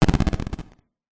retro game classic explosion 8 bit arcade 80s weapon attack